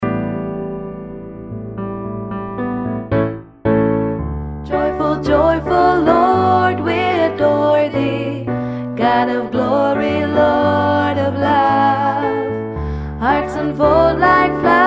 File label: Joyfull alt